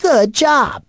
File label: Correct